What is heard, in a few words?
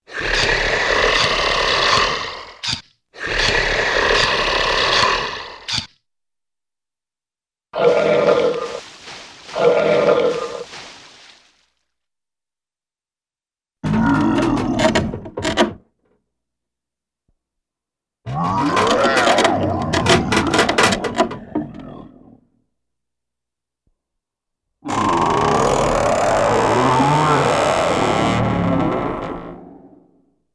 Throaty Growls With Footsteps; Cartoon; Hollywood Edge; Cartoon Trax